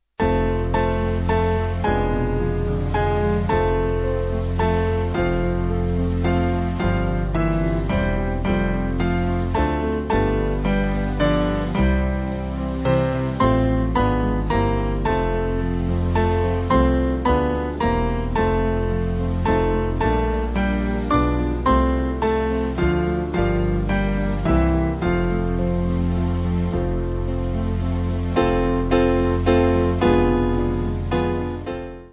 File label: band